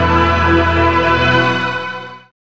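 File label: synth chord; E-Lab; X Static Goldmine 1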